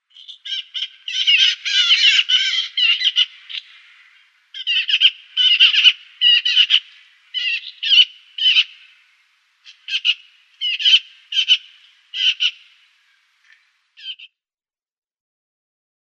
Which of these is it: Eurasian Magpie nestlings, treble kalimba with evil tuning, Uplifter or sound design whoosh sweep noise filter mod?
Eurasian Magpie nestlings